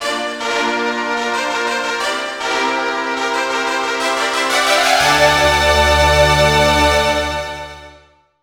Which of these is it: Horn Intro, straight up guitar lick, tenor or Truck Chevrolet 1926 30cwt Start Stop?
Horn Intro